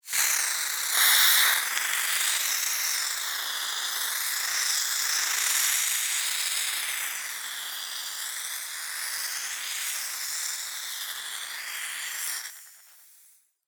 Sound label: Blow Torch Flame, Blow Torch Cutting Flame Sizzle; Tech; BlastWave